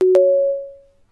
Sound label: notification mobile